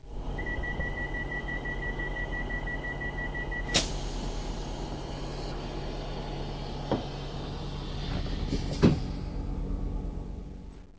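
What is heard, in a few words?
Door close, Train cl158; OpenBVE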